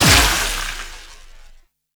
Explosion; Warframe; Moa Pet; Multron

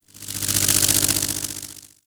Magnetized Random; Warframe

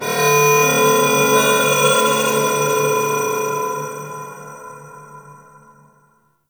Temple Moving Bells Two, Corpus Ship ambience; Temple One

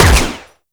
Fire; Warframe; Latron Prime

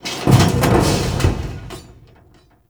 Large Vehicle Creak; Warframe; Ambience; NineteenNinetyNine

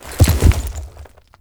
Horse Jump Land Heavy; Vehicles; Foley Actions; Warframe; Duviri

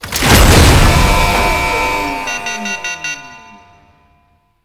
Elevator Slow; Gameplay; Warframe; Jade Shadows; Ascension

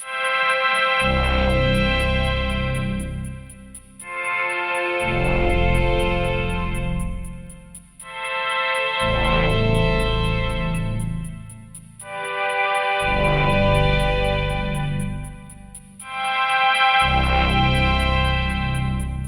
Market Music; Warframe; Venus; Fortuna

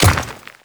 Grineer Royal Guard Dirt Step, Footsteps

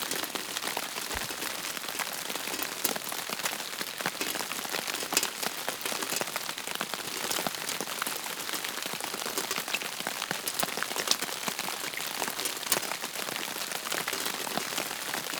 Generic Rain Suit Loop; Ambience; Props; Warframe; Eidolon Plains

